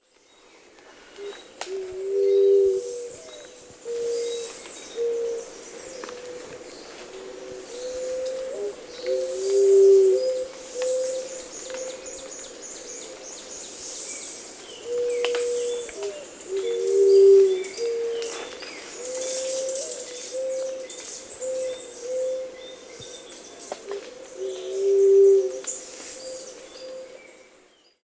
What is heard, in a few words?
Bridled Quail Dove call, Grande Anse St. Lucia